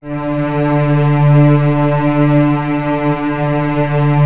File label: Virtuoso Strings, R; ILIO; Kirk Hunter Virtuoso Strings VOL-2; 90 s Sample CDs